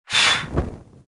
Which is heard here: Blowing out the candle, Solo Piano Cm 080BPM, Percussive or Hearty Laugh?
Blowing out the candle